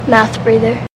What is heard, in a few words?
Mouthbreather